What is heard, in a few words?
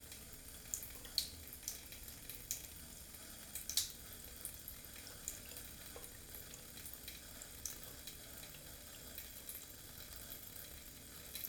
Water Flowing In Bathroom